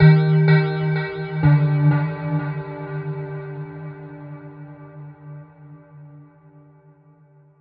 126 bell-echoes, melodic loops

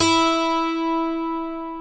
12 STRING TOP E, Twelve String Guitar

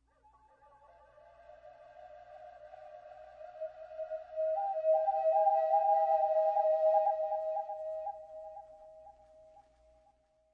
verby bamboo flute; Flutes

